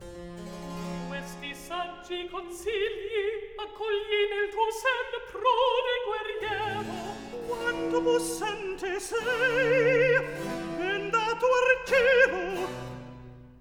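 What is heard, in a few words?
Recitativo, Handel opera; Act 1; Questi saggi consigli; Handel - Rinaldo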